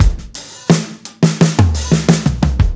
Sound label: 87 FILL, drums; Basement drums vol 2